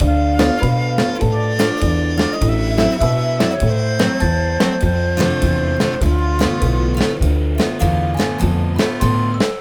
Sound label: Tutorial Loop; Tutorial Music Bank